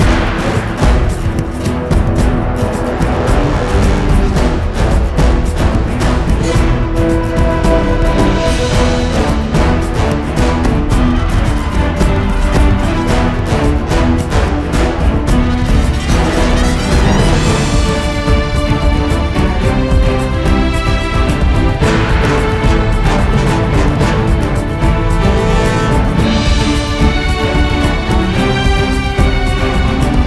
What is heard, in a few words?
Battle Loop